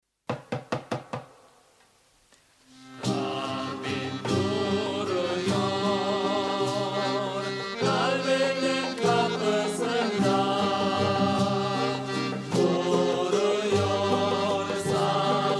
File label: hymn; turkish; GRN; 100 Stories